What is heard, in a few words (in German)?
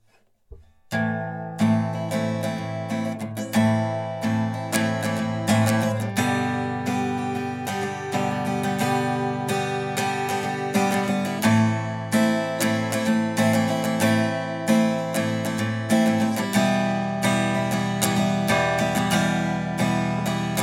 mikro offen